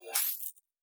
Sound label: Additional Weapon Sounds; Sci-Fi Sounds